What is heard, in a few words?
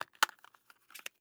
Household Remote Remove Battery Cover On Remote